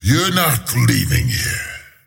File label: attack pain; slyrak; dota